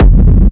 industrial techno kick oneshot - f; kick drum samples

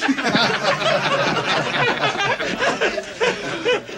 LAUGHING IN THE SALOON

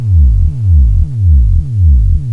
synth bass analog; loops

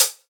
light high hat sample g sharp key, hi hat closed

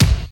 studio kick drum single hit C key

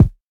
good kick one shot G sharp key

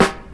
dry snare drum g key; snare drum samples

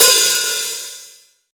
wet crash d sharp key; Cymbal; drum sample; one shot

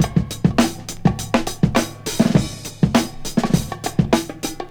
102 bpm 00s drum beat A key; drum loop

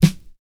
natural steel snare drum sample d sharp key

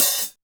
sizzle open hi hat sample a key

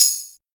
low treble pedal hi hat single hit g sharp key

